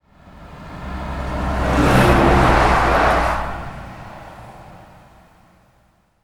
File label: truck pass by; transportation